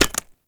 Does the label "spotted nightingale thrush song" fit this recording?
No